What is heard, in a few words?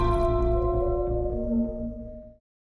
Howl; Nightwolf